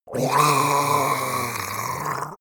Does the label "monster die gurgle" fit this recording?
Yes